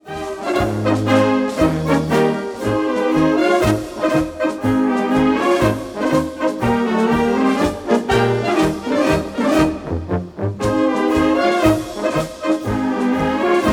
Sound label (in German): Marsch